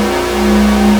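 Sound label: DISCO BELL A4; Analog Odyssey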